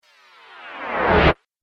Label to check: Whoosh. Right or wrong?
Right